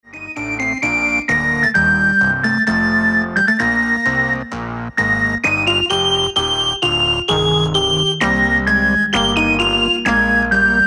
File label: Chorale Prelude; Wachet Auf BWV 645